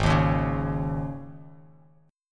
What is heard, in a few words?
G sharp Suspended 4th G sharp, Bass Standard; G#sus4; strummed; JGuitar